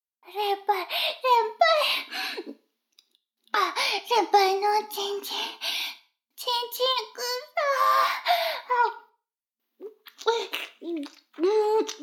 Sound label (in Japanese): フェラ音声; 逆時間停止されて